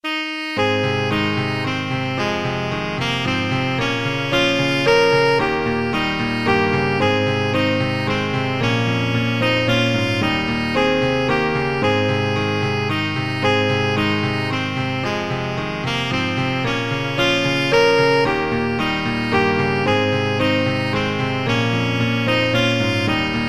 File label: cader idris, saxophone